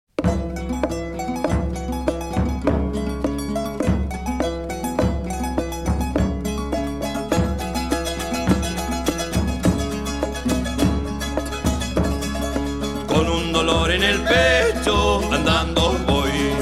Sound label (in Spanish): cantando voy